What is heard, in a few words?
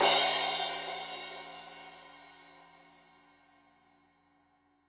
Crash 15in L edge, Cymbals Stereo